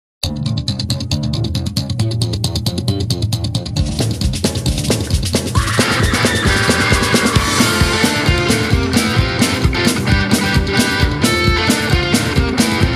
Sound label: Radioactive Rock